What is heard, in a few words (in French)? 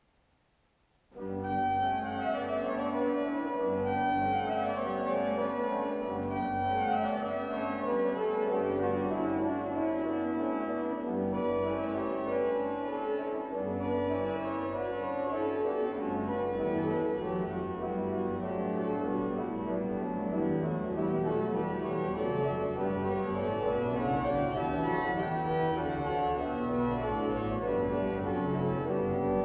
improvisation musicale